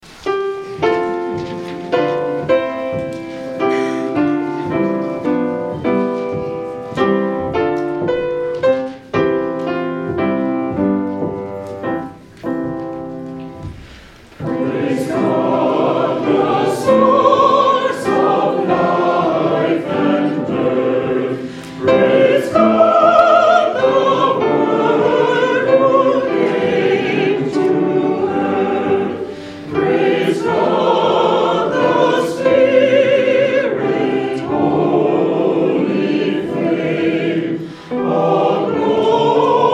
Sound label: hymn; Praise God, the Source of life